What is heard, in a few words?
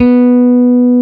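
PEAKS BASS B4; PEAKS BS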